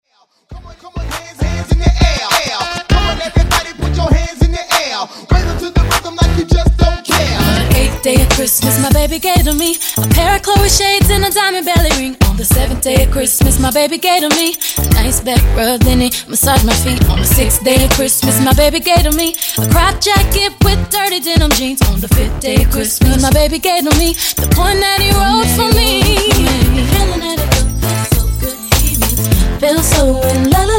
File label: Hype Intro Outro 100 Bpm